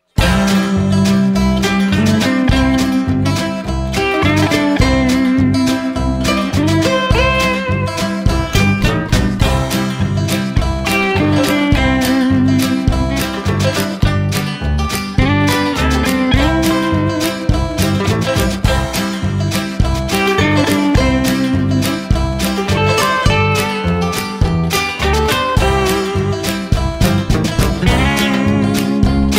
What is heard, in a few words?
STEEL AND BANJO